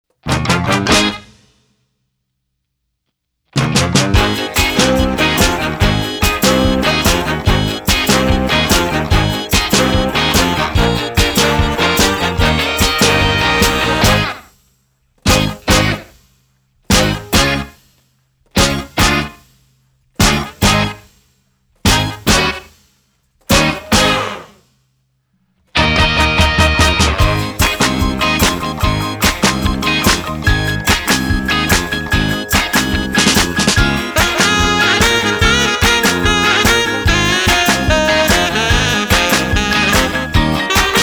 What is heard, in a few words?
accomp